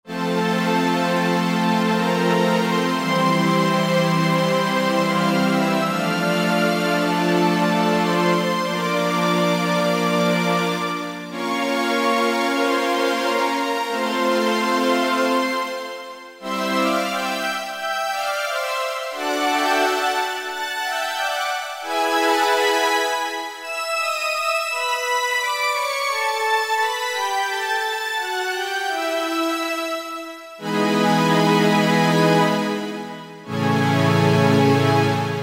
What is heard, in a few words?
Vintage Orch, Roland JV-2080